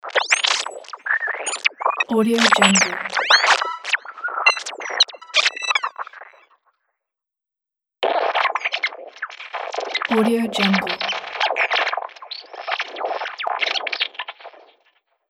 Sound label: communication signal malfunction